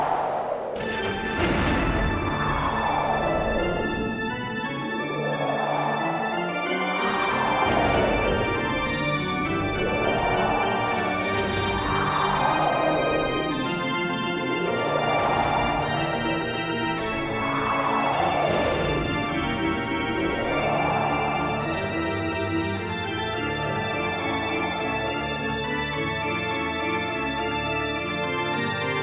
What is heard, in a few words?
Castle Music